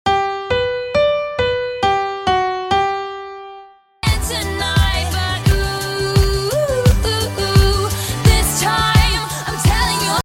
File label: Oooh oh oh oh oh